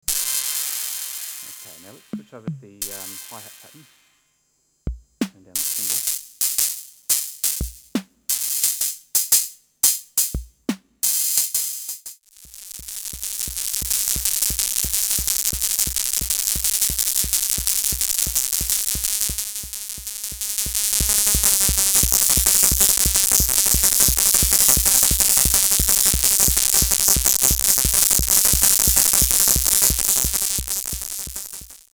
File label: TR-606 cymbal hi hat mods; 32 sec excerpt